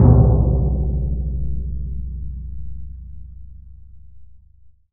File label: TMP C#, Synclavier Percussion